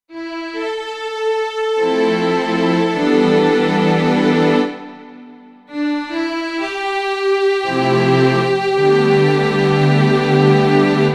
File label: Korg Grandstage Strings; Platinum Strings